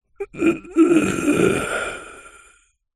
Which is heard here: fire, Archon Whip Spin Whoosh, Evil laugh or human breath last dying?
human breath last dying